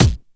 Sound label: Dance Mega Drums, KIT D+B; Best Service